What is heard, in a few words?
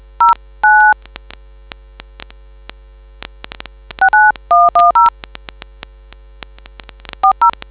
dtmf